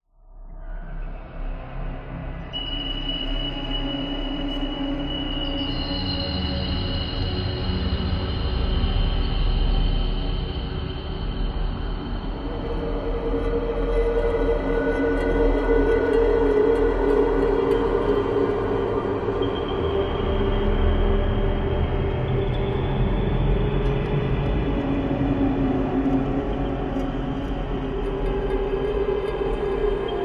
horror drone atmos evolving dark sinister eerie airy weird metal rattling element